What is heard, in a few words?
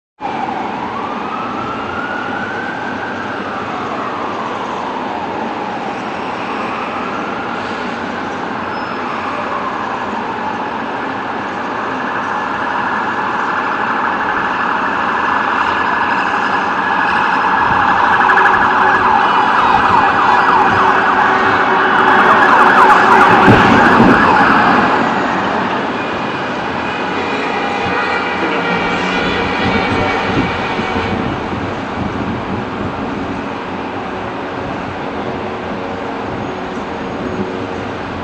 south tower plane; wtc; varick